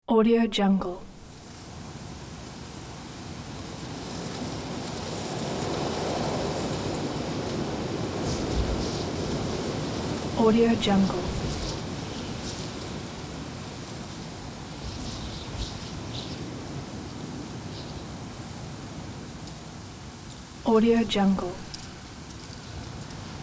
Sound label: winter wind